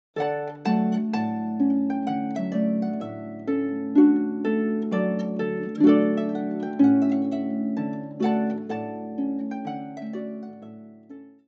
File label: Trumpet Tune